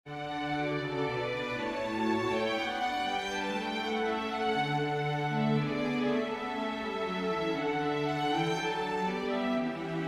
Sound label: menuet for string quartet; Charpentier Marc-Antoine